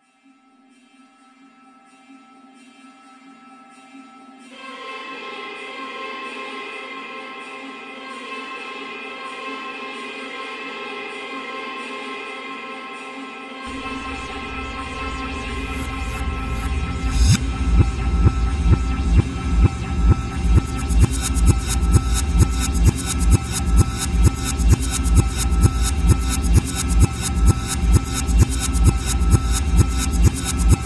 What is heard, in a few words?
Acid Noise